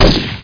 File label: M16 crack; specops